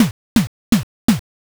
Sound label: footstep walk